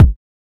Small Reverb Kick Drum Single Hit B Key, Royality free kick sample tuned to the B note